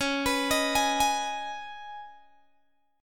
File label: Db 7th Suspended 2nd, Guitar, Open G